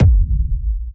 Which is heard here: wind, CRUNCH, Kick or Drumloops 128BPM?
Kick